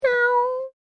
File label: Fake Cat Meow Human Fx; Cat Sound Effects